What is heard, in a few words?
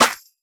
clap; foxdot default